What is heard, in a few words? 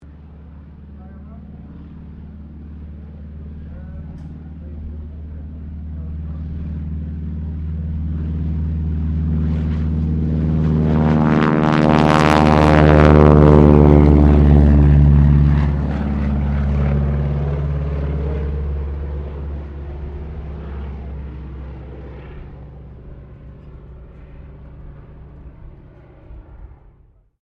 Aircraft CAC CA-25 Winjeel takeoff, WW2 era Australian training aircraft; Airplanes; Sound Effects:Vehicles